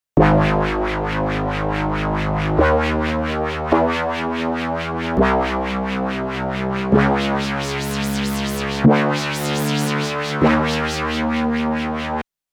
reso LFO

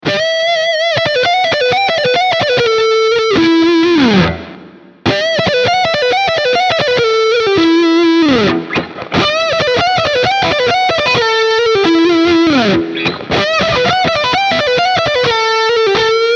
Hi Gain Lead All Positions

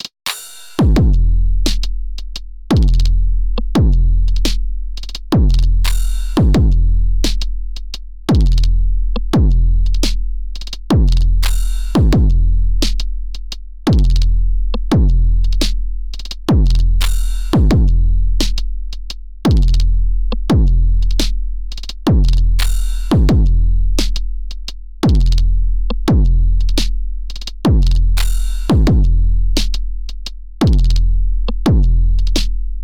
Character SoftGain 8x; Metric Halo v4; Drumazone2

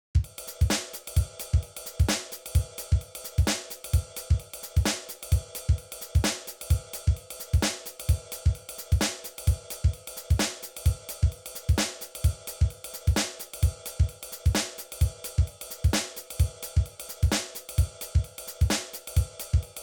Jazz; MDrummer

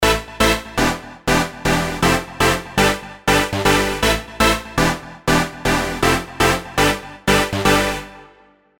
EZkeys 2 Electronic Pop House Dirty Saw

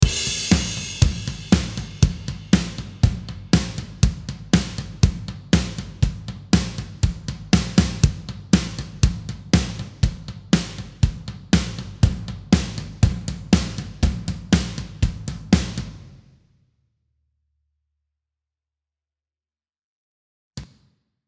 Addictive Drums 2 Metal Kit

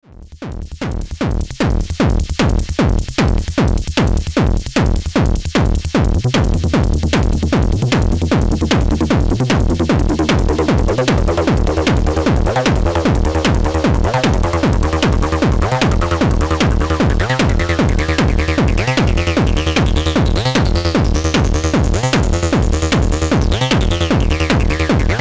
Soma Pulsar 23 Patch Sidechain MIDI

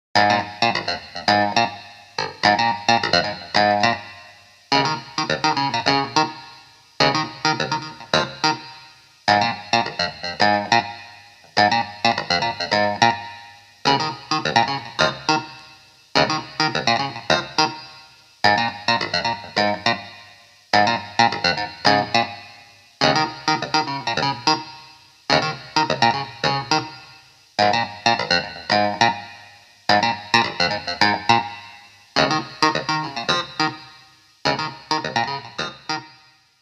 Hohner Clavinet D6 Clean Clavinet

